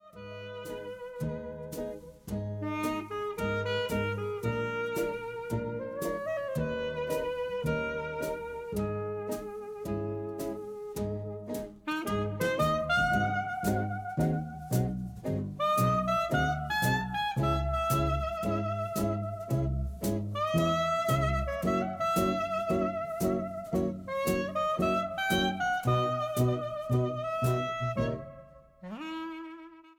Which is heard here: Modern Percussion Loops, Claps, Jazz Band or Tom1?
Jazz Band